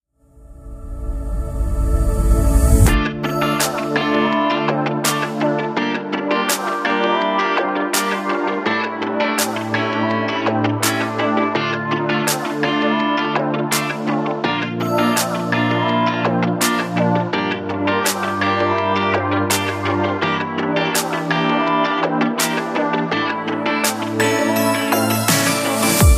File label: instrumental version